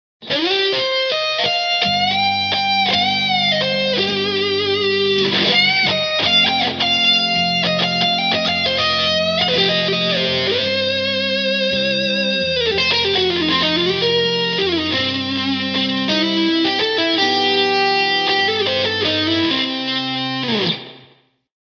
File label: Big Lead